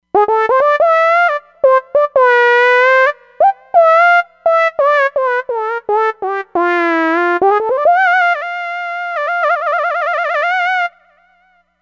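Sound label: Modulated Lead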